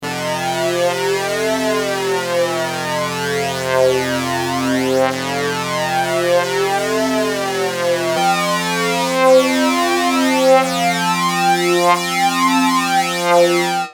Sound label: holmes jet flanger